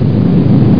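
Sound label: wall fall; roc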